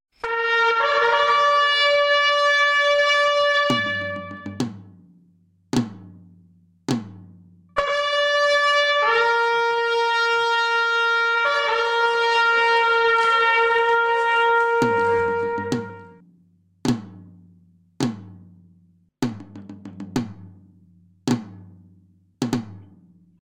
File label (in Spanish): toque cofradia con tambor